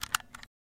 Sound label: Cassette Play Mini, Cassette Player